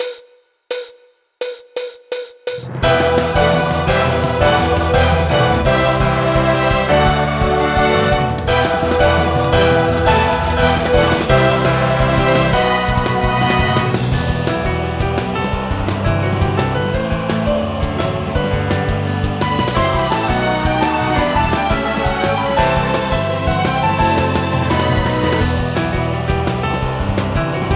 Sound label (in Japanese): ryoute ensou